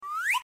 KART get Gag; Poodletooth-iLand; Panda3D

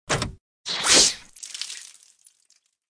squirt flower squirt miss

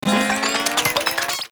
train track appear

